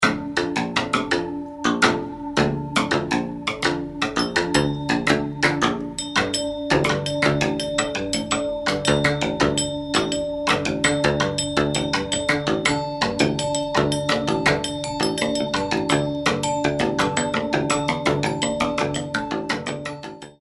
Slap Tubes Quackers

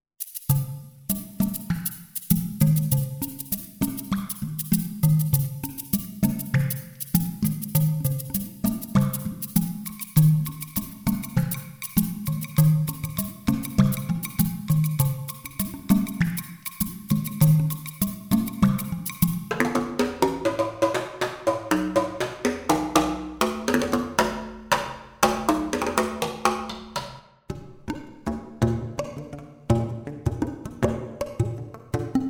plosives

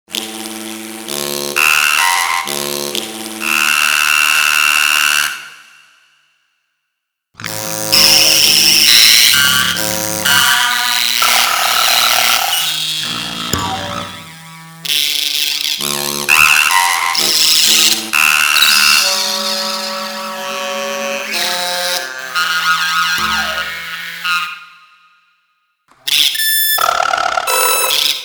Trombone Bell

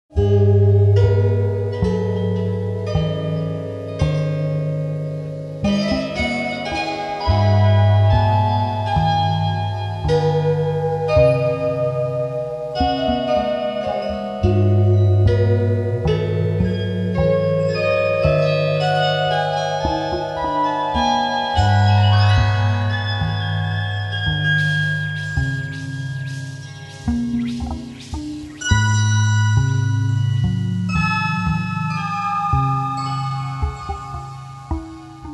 harmonic zith

